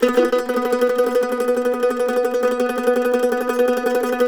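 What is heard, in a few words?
mandolin tremolo B3; Sampleheads; Whole Lotta Country 1 & 2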